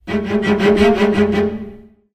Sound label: jockey bacterias, music; Left 4 Dead 2; Source Engine